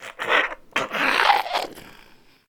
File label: idle, zombie; DayZ-Epoch; dayz sfx